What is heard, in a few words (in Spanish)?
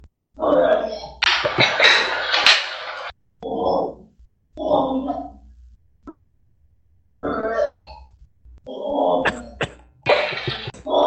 sheep vomitando